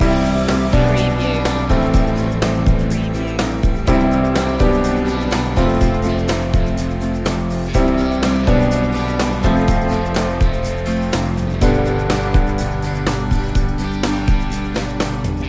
casual goings loop

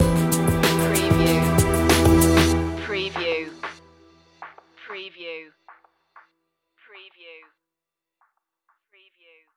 seasons stinger

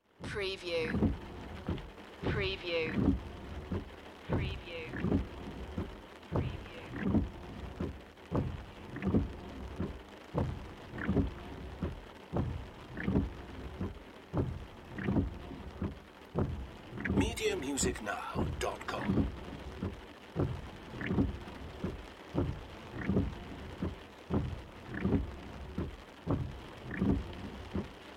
Auto Wipers Low; sound effects bible

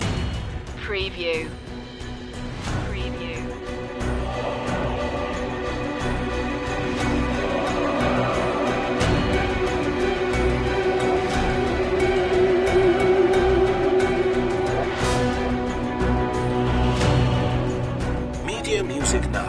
with choir

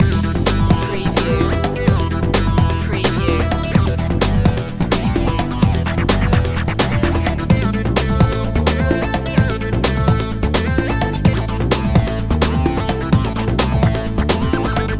secret agent loop

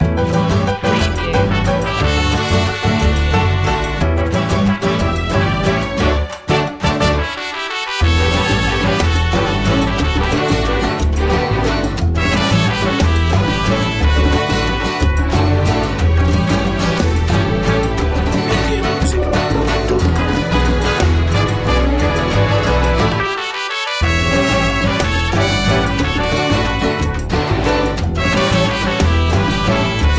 go mariachi go

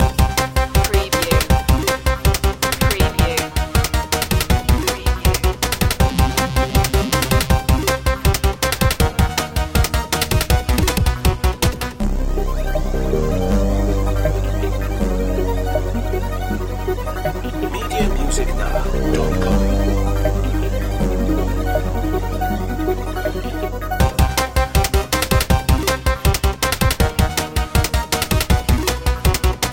retro video game countdown to zero long looping

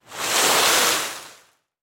Ice Skates Scrape; Sound Effects Bible

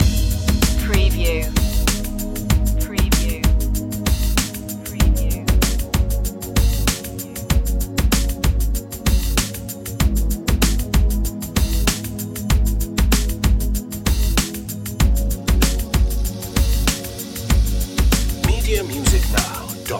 gregorian groove loop

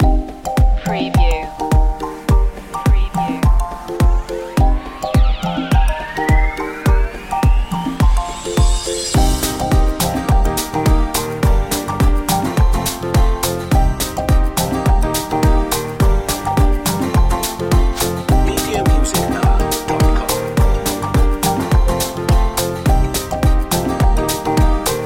island beats loop

